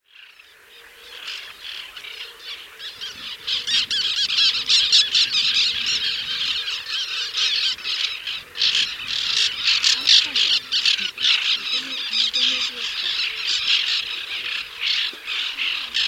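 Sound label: white-winged tern voice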